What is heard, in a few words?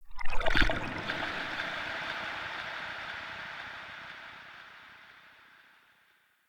trippy lap, ambience; Soul Plane